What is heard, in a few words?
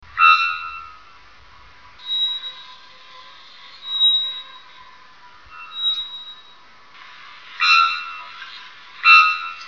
The sounds of birds